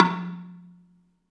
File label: BELA2 F2 R, BELAFON; USB Soundscan vol.60; AKAI